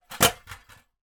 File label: household safe small desktop metal drop on floor